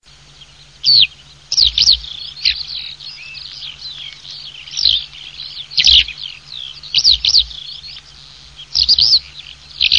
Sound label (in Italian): allodola, birdsound